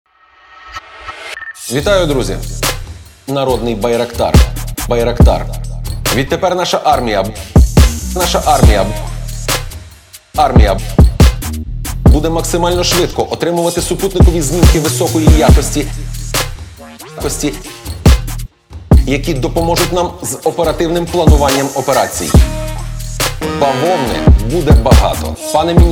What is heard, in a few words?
Remix